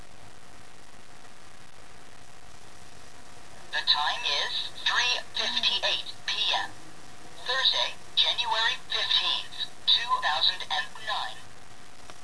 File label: cube clock sound